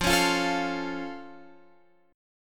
F Augmented, Guitar, Open F; strummed; down

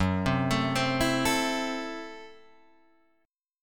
F sharp Minor 7th Flat 5th F sharp Guitar Open G

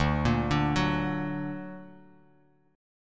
D Augmented D down Bass Standard; D+ arpeggiated; JGuitar

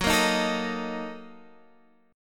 A sharp Major 7th Suspended 4th Sharp 5th F sharp

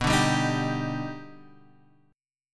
Eb Augmented Major 7th B

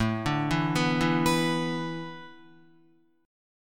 A Suspended 2nd Suspended 4th down Guitar; Asus2sus4 arpeggiated

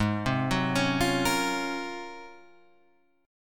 Ab 13th Ab Guitar Half Step Down

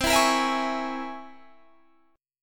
C 7th Sharp 5th Flat 9th C

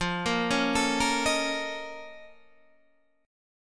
A sharp Minor Major 11th F

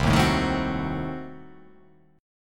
C Diminished 7th C

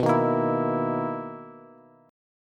B Major 11th B down Bass; BM11; strummed; JGuitar

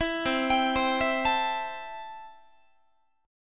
C 6th C down Guitar; arpeggiated

